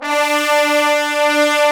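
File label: BRS TBNS, Trombone Section 3ff; Roland L-CDX-03 Disk 2; Bone Sec.FX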